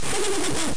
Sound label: Digit Mmmhhh; Puffy's Saga; UBI Soft